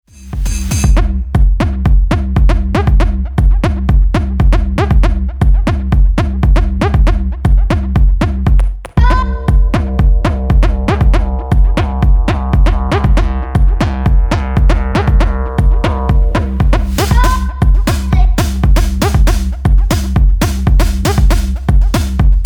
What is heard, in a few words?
acid gentle moves